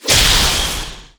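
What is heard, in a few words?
Battle Mace Critical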